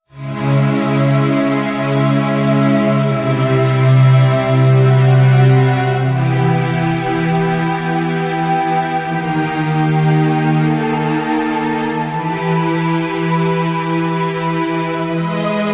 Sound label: impulse cool orchestre